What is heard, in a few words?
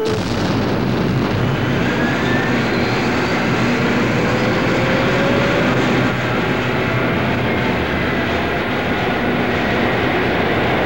takeoff; quake2; coop